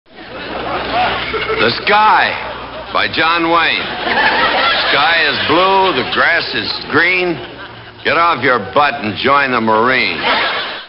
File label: laugh